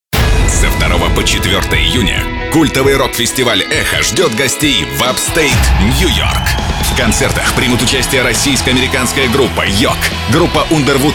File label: echo radio